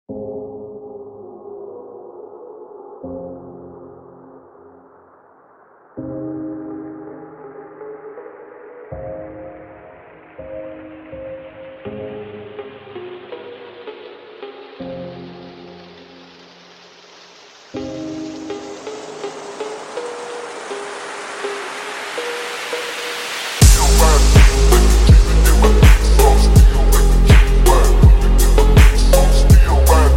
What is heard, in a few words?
slowed reverb